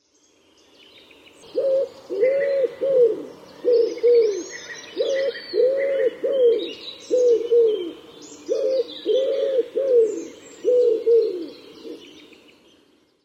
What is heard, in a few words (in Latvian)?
colpal dziesma, balsis; a.celmins